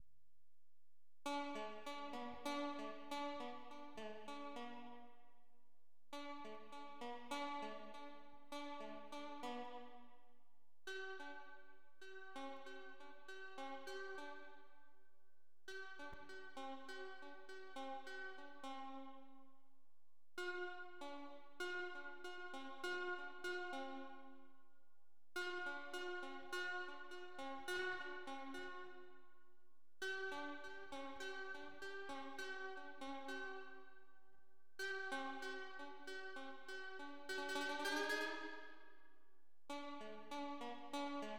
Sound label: slinky strings